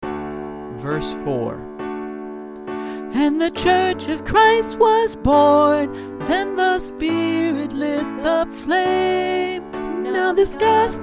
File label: Soprano